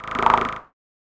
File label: alert, notifications; Android